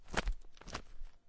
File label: angelic defeat cloth; smite; thanatos